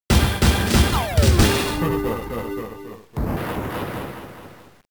bomb finish; WarioWare Inc

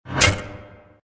menu click

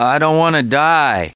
die

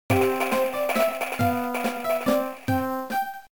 BGM BOMB; WarioWare Inc

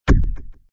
mine exp

